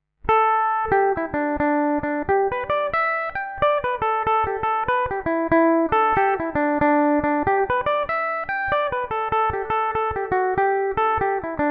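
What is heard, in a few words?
G major belmuse, irish mandolin; The Banshee’s Wail; Belmuse EM-190 electric mandolin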